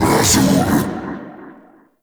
Cast Vocal; Warframe; Uriel; Brimstone

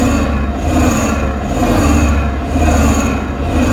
Moon Spinning Machine Large; Orokin Moon; Warframe

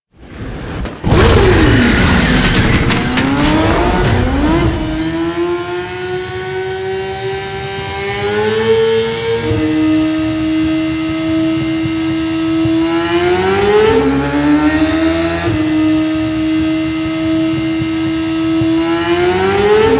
Motorcycle Mount; Warframe; NineteenNinetyNine; Cinematics

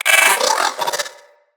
Riot Moa Vocal Attack

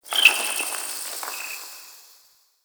Interior Cave Critter; Ambience; Duviri

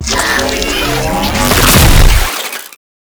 Proto Infested Ancient Mid Spin Flesh; Warframe; Abilities

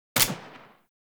Dual Pistol Mech; Warframe; Archwing

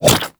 Infested Throwing Star Adhere; Warframe; Infested Throwing Stars

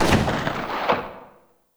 Revolver Pistol Tail

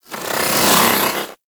Bow Draw; Weapons; Warframe; TheNewWar; Omicrus

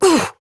Pain, Adult Operator; New War

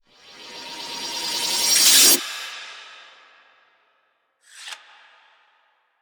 Sword Sheath; Teshin; Warframe; Cinematics; TheWarWithin; TWWQueensLair

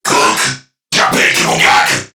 Surprised Crewmen; Dialog; Barks; Battle; Warframe; CorpusCrewmen; CrewmanA

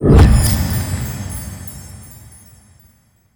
Memory Room Vision Zoom Complete; Warframe; Sacrifice

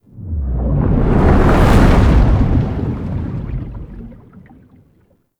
Underwater Exit; Edens Ultimatum

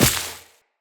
Step Veg, Footsteps, Walk; Characters; Tenno

